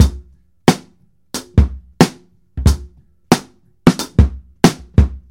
Just HipHop 90

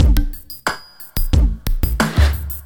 HipHop Robo

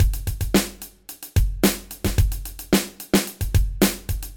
Groove Lab Hip Hop

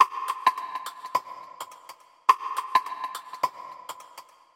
Pop Can Top Beat 105

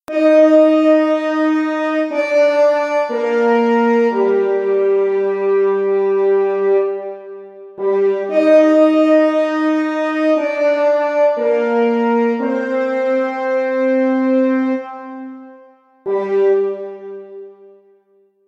Orchestra Horns

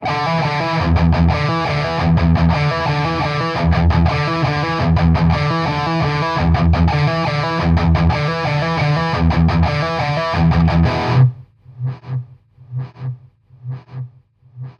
Hard Rock Guitar E

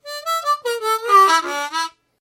Harmonica Ending

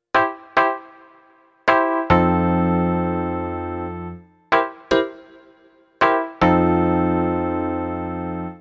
Off Beat Jazz

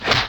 Kung Fu Punch Sound

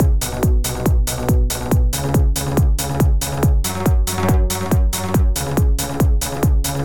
Melodic Trance

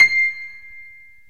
Piano Key C

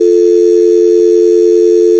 Phone Dial Tone

